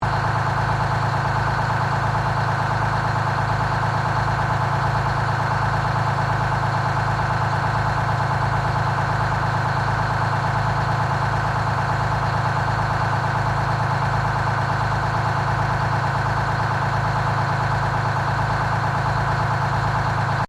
Truck Kenworth 12V71 Idle Front, Old Kenworth truck; 1969; Truck Sound Effects; Vehicles